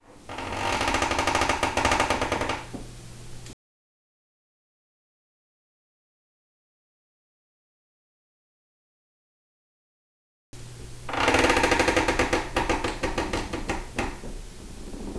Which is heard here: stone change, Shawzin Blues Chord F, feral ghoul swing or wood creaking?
wood creaking